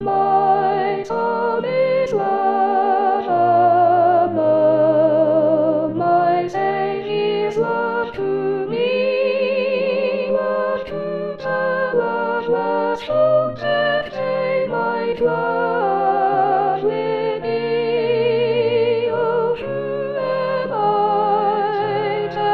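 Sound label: My song Soprano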